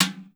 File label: BESEN, DRUMS; Xsample VOL-9